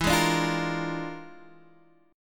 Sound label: E 7th Flat 5th Flat 9th; JGuitar